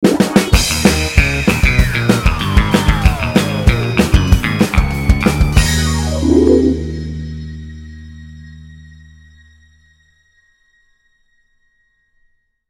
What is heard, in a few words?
Energy Dance